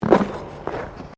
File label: Ice skating Kickoff